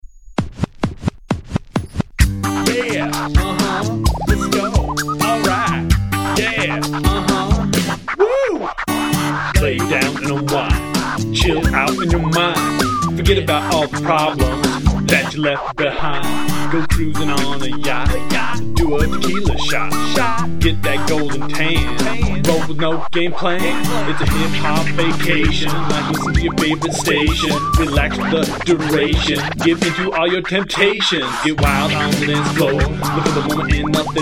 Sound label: hip hop